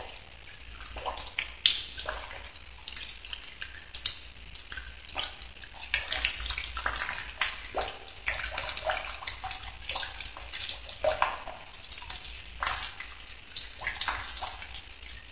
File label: tunnel drips, ambience; svencoop; aomdc